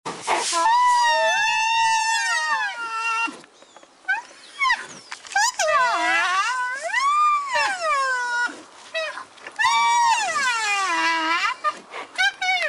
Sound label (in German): nashorn, Zootiere; zootiere erkennen